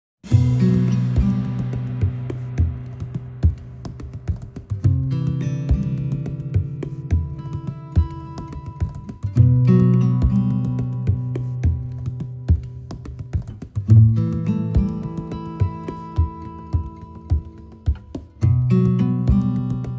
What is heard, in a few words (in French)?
Folk; Mitterand Tours